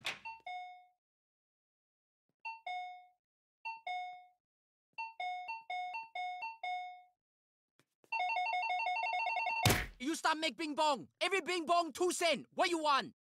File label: Bing Bong